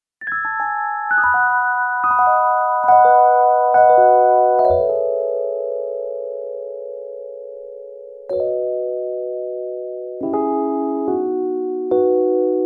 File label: Solo Soul